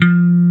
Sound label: PUMP BASS F4; Drums In Your FACE!